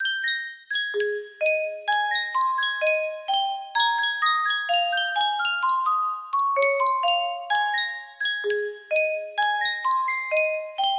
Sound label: music box